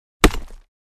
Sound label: Battle axe hitting wood